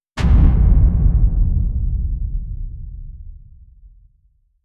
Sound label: low pitched holy explosio